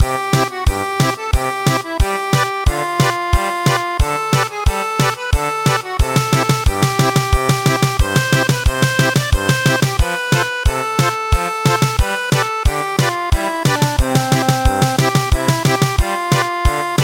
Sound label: German Polka; There goes last year